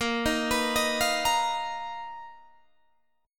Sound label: B Major 7th Flat 5th Bb, chord shape